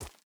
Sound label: wolf footstep concrete hard; Rust